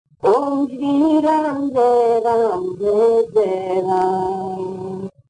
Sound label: Chants; TARAKA